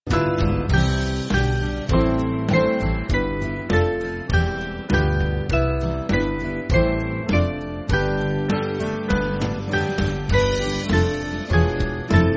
Band; My God How Wonderful; Westminster

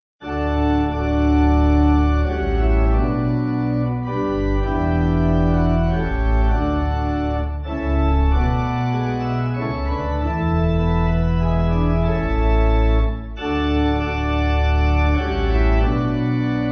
Pipe Organ, Music Backing Files for Churches; Bradford

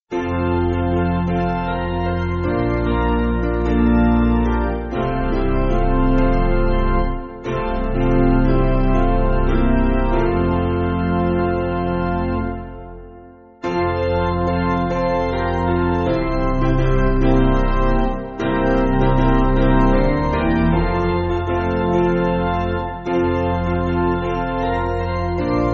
Piano

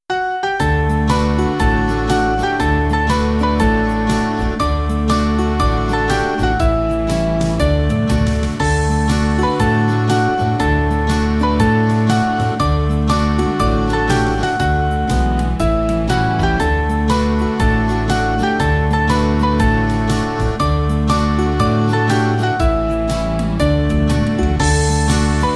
Kids Music; Running Over; Hunter

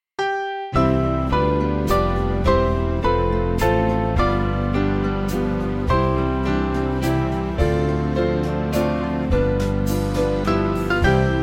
The Fields Are All White, Band; Small Band; Colvend

